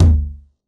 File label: Perc, retro house samples, Drum Hits